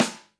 Snare; Reference beat bpm95; urban grooves; Rock

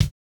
kick, house

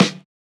hiphop snare, Effect on

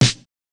snare, snare house

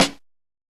Hiphop snare; Dry original

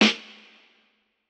Jazz hop snare; Hiphop

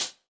chillhouse hihat; Electro

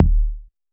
Modern kick, Electro

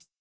hihat; Reference Beat BPM120; j city pop 1980

